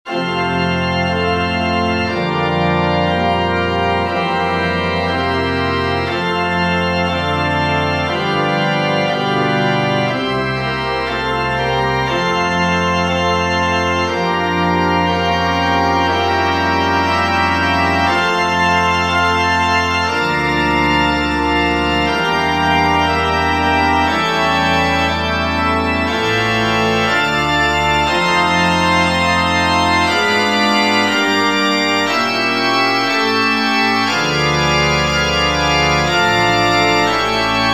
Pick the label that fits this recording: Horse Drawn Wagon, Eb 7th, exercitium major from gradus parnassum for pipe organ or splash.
exercitium major from gradus parnassum for pipe organ